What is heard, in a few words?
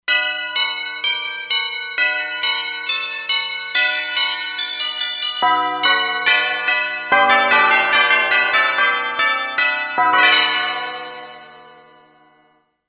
Bell Ensemble; Factory Presets; E-mu Proteus-2